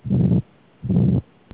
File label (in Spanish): defecto septal ventricular